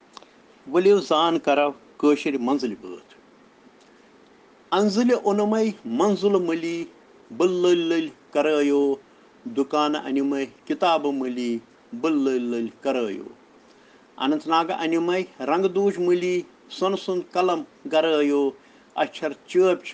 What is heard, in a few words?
Children Rhyms in Kashmiri